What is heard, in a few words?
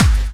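snare drum bass drum, Dance Mega Drums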